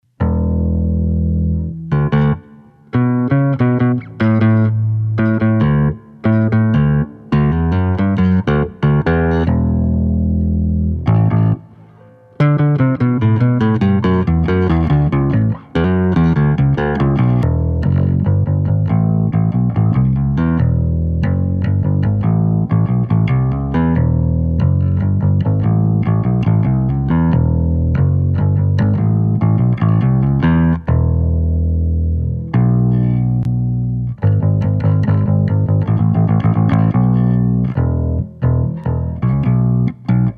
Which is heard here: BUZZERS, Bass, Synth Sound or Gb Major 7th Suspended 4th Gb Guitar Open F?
Bass